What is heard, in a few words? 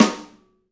Pop Snare, Roland BK-5; Snares